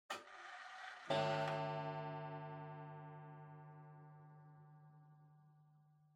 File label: Pendulum Clock Chime Far Short